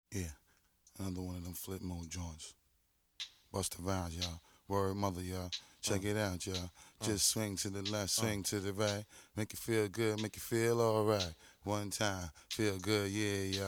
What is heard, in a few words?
acapella